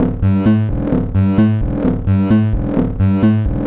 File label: MiniBrute Loop, 130BPM; musicradar; bitcrushed; Crushed Loops